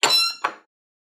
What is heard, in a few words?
Squeaking; SoundsCrate